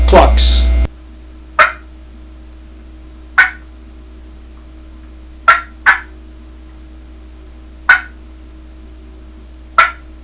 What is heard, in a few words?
clucks, turkey; dixie